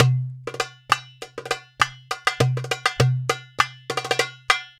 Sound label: Tabla 100